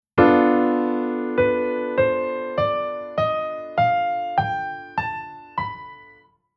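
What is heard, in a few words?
Chord Scale Minor Melodic Minor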